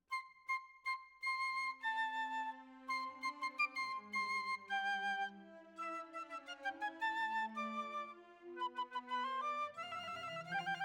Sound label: Flute